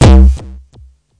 distorted bass blast with echoes; Kicks; single hits

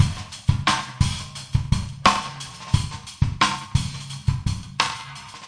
rolling laidback, 087 bpm, drum loop

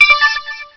Nova Beep

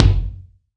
bombo kick; Kicks; single hits

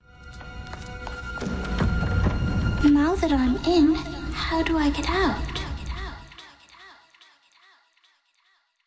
wobble techno

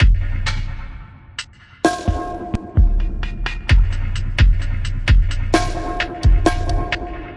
130 deep spacious dubber, drum loops and breaks

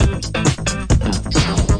guitar funky funky; SAMPLED MUSIC LOOPS

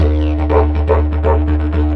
didgeridoo groove; world

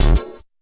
fx drum

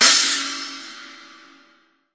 CHINA, drum kit; REAL LIVE KITS

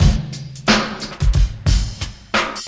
090 compressed n funky, drum loops and breaks

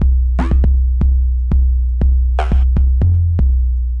lotsa bottom bass only, 120 bpm

